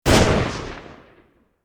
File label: Bullet Time; FXHome; Bullet and Gun